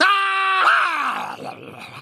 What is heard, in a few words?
male murloc attack